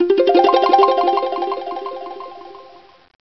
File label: Echo Harp